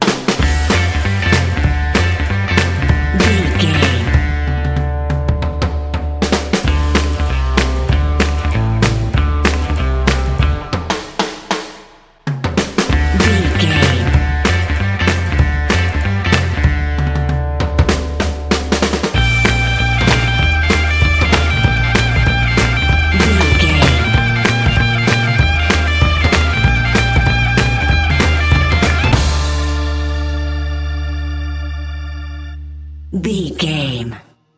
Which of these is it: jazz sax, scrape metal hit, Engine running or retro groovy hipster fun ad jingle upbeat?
retro groovy hipster fun ad jingle upbeat